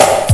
SNR

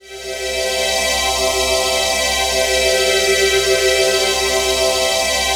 PHASE PAD, ATMO PADS; Best Service; XX Large

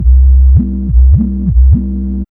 B LOOP

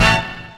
HORNHIT, HORN STAB

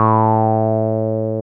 bass; E-Lab; X Static Goldmine 1

